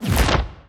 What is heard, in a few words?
bighorn small hole close